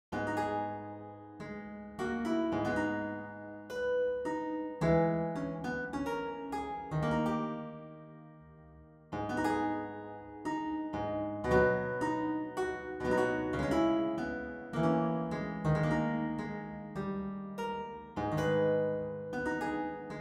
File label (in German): Lautenwerk